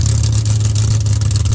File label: EXHAUST IDLE